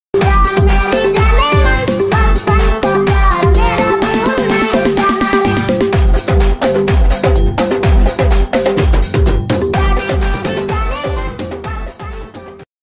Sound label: background music